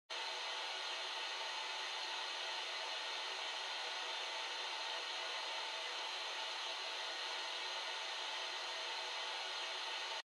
Radio Signal Noise Far FM

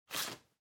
Bingo Card Noise

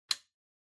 Wall Outlet Dry Lock

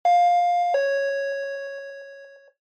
Intercom Ding Dong Close

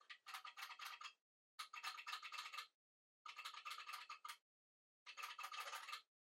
Flip Clock Far Time Dial Mid

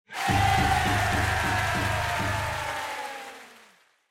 Cheer "Yay" Low Long Applause

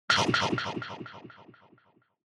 Motion Eating Delay

